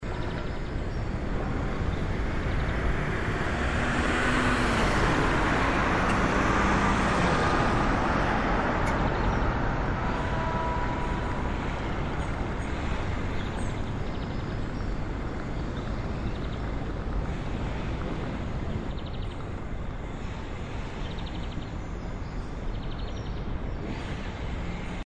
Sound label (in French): son drone 40m